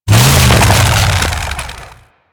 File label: Saw Forward Combo Rev, Melee Weapons; Warframe; Grn Ghoul Saw Player